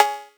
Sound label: cowbell electro, misc percussion